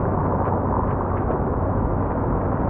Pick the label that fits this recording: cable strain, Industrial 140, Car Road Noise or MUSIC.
Car Road Noise